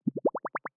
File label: bubble